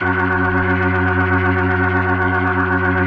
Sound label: F#2F, B3, Vintage Keyboards